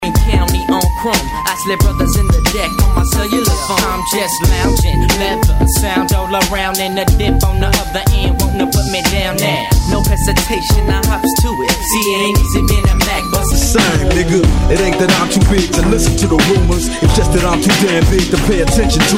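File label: Old School Hip Hop Megamix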